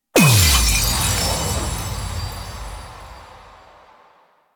void tear closed; Cephalon; Warframe; Cephalon Weave

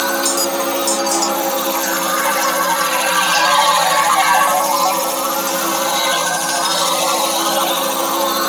Ship Data Pillar Loop; Ambience; Props; Warframe; Corpus Ship Remaster

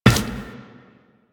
Run Glass, Footsteps; Warframe; Tenno

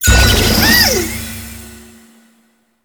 Nokko Mushroom Captured; Warframe; Nokko Colony; Mushroom Hunt

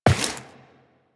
footsteps walk; Warframe; Tenno

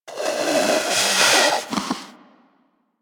Predator Snore; Warframe; Hunting Critters

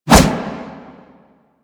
Melee Kick Whoosh; Warframe

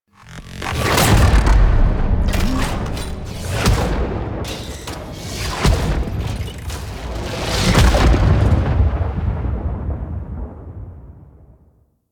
Mech Event Cinematic Mechs; Warframe

